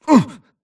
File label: grunt hits; Titanfall 2